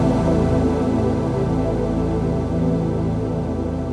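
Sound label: 122 BPM Pads C major A minor Tonic A, ambient synth pads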